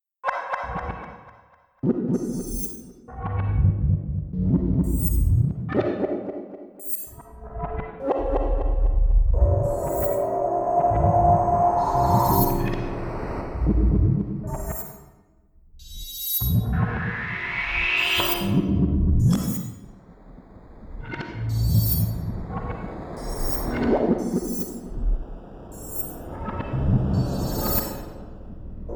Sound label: steel teardrops running along copper singing saws; weeklybeats-2020